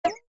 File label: maze pickup; open toontown resources